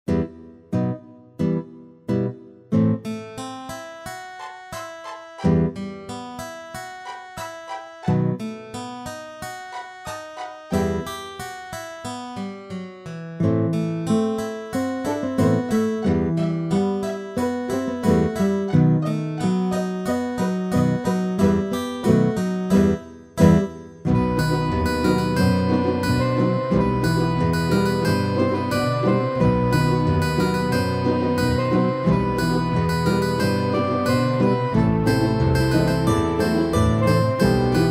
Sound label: two guitars